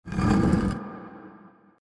line Win out; Champions of Valhalla

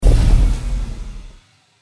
anticipation end; Crystal Furnace

